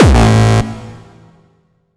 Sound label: pretty solid kick actually reverb short tail A